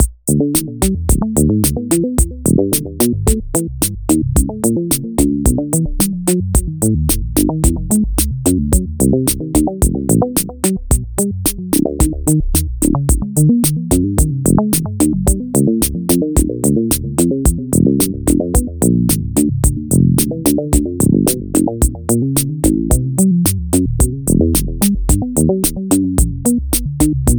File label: signature bass; richard devine; acom; aas; objeq delay